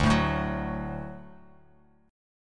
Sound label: D Major D down Bass Standard; D strummed; JGuitar